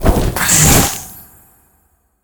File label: drain; battle